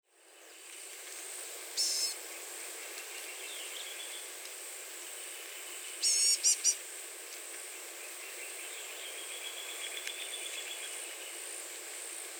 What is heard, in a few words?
Blue-gray Gnatcatcher Calls